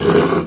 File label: uruk hai hit; spawners mobs